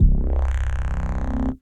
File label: Twin Bass; Ableton; Skitter and Step